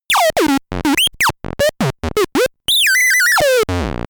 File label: talking arcade